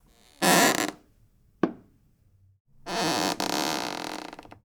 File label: Old wood floor creak creaking slow back and forth; Matt Script; Squeaks & Creaks